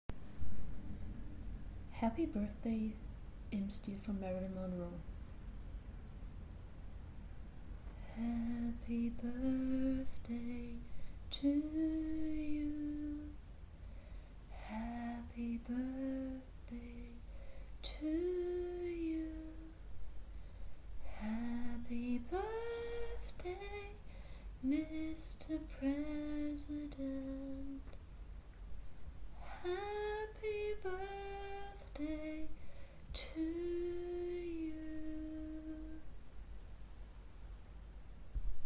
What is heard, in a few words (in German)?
Kopfstimme finden Happy Birthday